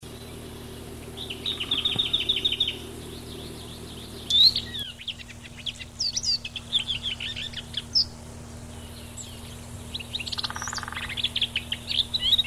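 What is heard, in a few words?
Sylvia curruca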